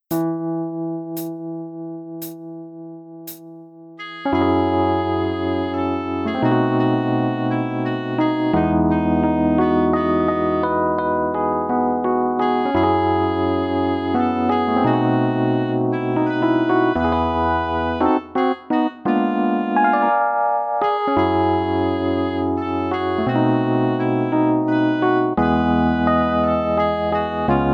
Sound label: Piano